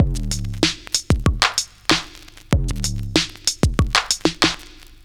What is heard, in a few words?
Drum loops, Electro